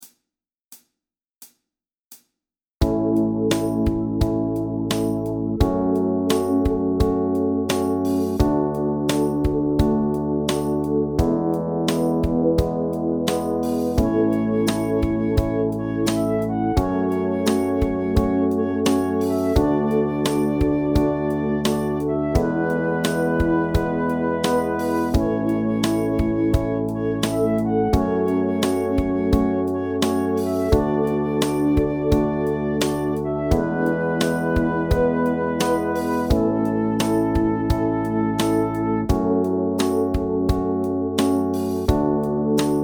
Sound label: Bass A2